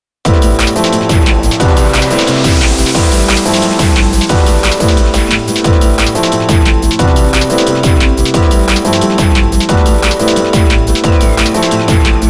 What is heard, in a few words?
dnb